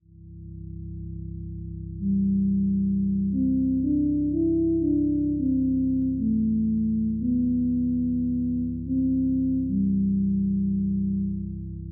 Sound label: greenhouse theme low clean